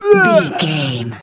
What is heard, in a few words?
voice male groan